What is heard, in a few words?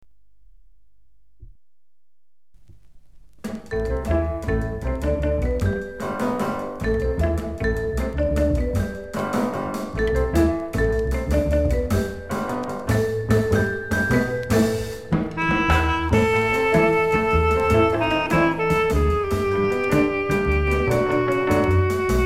jazz